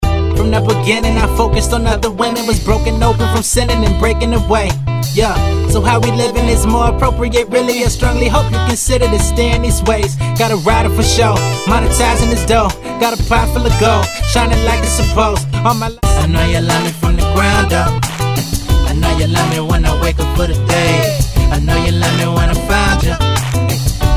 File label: Hip Hop Vocals